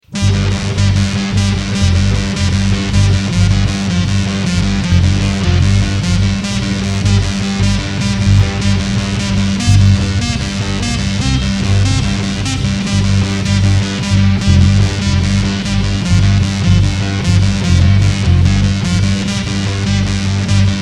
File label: random riff